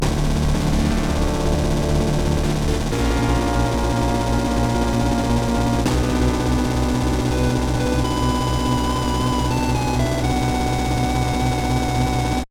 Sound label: Jingle; Shining Force; Sega; Megadrive-Genesis